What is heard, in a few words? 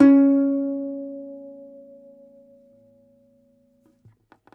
DOMRA, Plucked Strings, Segundo Domra